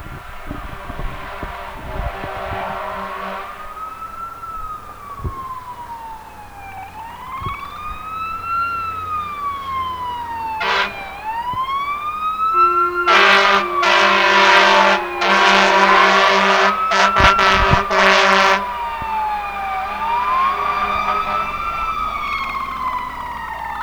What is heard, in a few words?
FIREENG